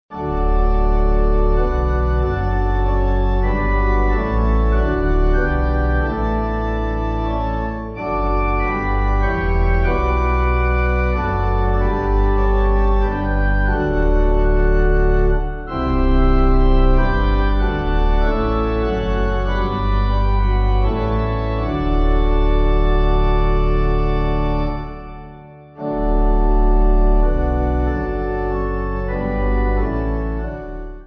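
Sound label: Pipe, hymn backing; Jesus To Thy Table; Tyholland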